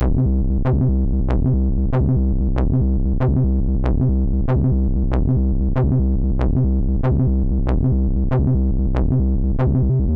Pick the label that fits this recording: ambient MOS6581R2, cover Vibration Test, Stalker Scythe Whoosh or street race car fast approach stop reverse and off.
ambient MOS6581R2